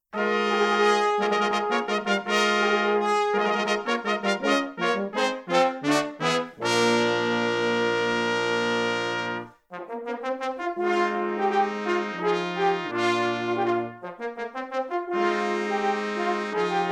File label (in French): Fanfare; DUKAS; Fanfare de la Peri